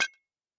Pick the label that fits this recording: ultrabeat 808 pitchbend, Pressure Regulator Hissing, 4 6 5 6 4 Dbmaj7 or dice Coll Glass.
dice Coll Glass